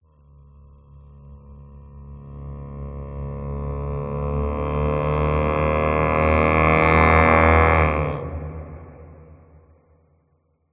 accent sound design horn swell ascend growl threatening ends descend abrupt; reverb; skyclad sound